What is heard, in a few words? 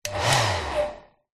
household hairdryer on off very short burst; zapsplat